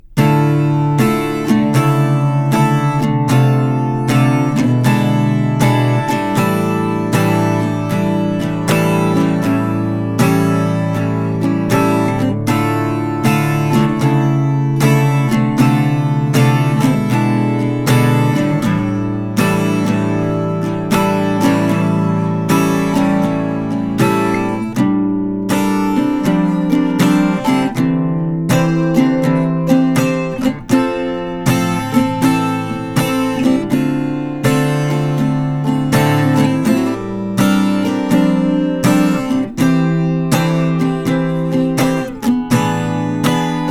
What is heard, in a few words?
Acc Guitar